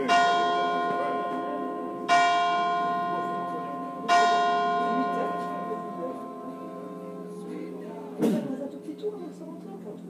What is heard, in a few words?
Sacré-Cœur Morning: Paris; I walked to Sacré-Cœur just after sunrise